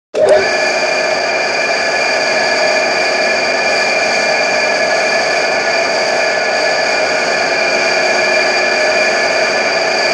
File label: Lathe Or Such Start; lathes